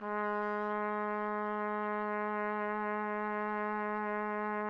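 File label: trumpet; Roland - LA Composer vol. 3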